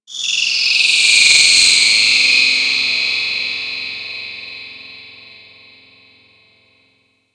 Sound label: Cephalon Tech Texture Small, Ambience